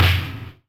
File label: stomp; HeavenStudio; cropStomp